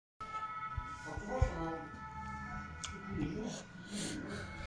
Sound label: cake screaming; bfb; tpot